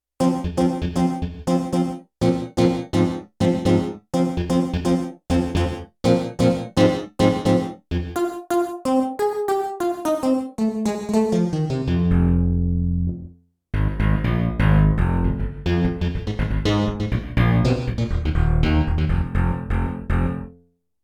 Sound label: Gated FM bass, Roland VC-1